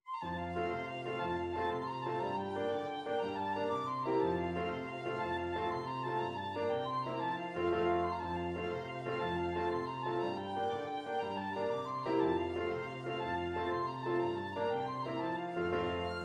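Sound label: irish jig medley, penny whistle, tin whistle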